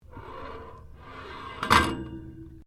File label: MECHANICAL PULLEY METAL METAL CLANK; Variou smechanical sounds; Clanks; Objects